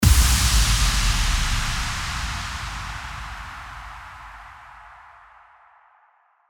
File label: IMPACT